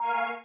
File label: ui power close, slider tones; Motorola ROKR-EM35